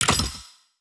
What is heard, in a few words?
ui treasure box